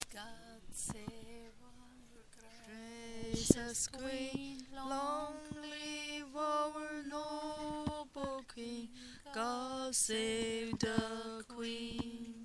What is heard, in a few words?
sing canada s royal anthem